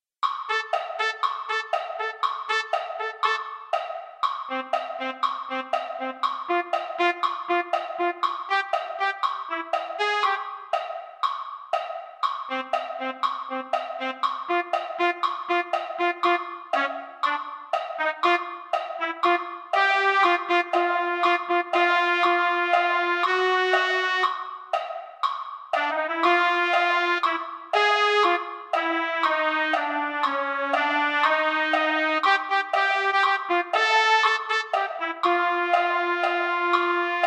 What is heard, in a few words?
trumpet, brass; holiday brass